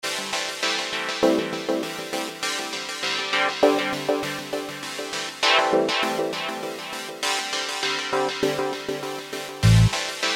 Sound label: Bass Drums